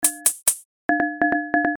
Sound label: Beat Tambourine and Gamelan; Funk Jazz; drum patterns used in the composition